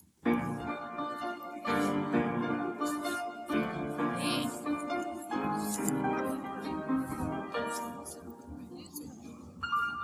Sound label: piano. sonatine a 4 mains